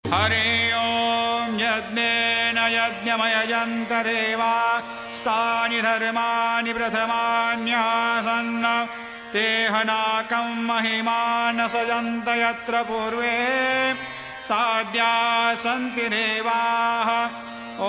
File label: baba aarti ringtone; saibaba